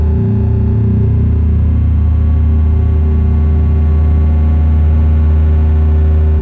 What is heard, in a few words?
data transmission loop, citadel; hl2 ep1; ambient, levels, citadel